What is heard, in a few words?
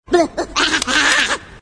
combo burst; minionalist